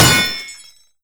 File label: padlock destroy; locks; Half Life Alyx